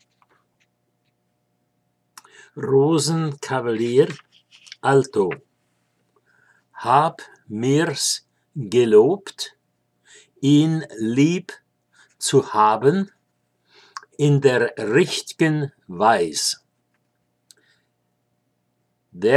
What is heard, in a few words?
Alto; Rosenkavalier; Hab' mir's gelobt (Strauss)